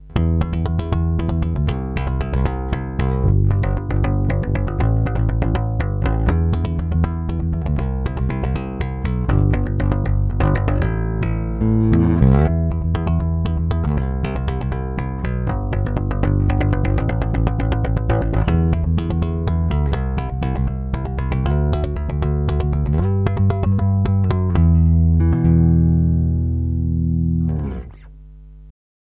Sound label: 300B EQ Metal DI